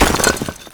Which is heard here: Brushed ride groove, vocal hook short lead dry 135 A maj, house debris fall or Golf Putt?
house debris fall